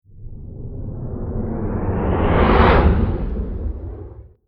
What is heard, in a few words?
Whoosh Sweep Eerie Low Jet By Single Slow Long; Sound Design; TitleFX; Whoosh Sound Effects